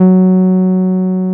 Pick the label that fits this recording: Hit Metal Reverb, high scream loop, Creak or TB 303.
TB 303